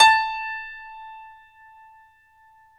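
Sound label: piano, Grand piano; Club-50 - Foundations Roland; Grand Pno 1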